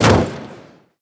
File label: car metal impact; factorio 2.0.55